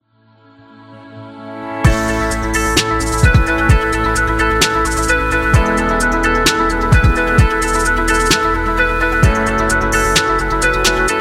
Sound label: Ambient Beat; corporate background